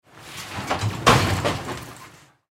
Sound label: Subway Door Close; Transportation; Subway Sound Effects; Blastwave